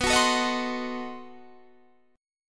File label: B 7th Flat 9th B, guitar chord